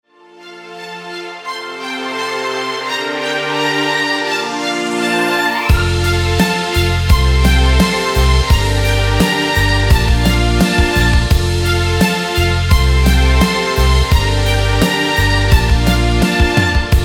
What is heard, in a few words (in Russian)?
Снятие минуса